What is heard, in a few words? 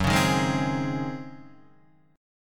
Db Major 7th Suspended 2nd Suspended 4th Gb